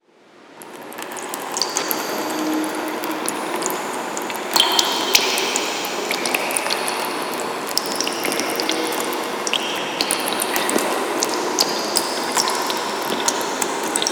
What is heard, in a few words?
Trap Ambience, Background Ambience; FX; Cymatics; Cobra Hip Hop Sample Pack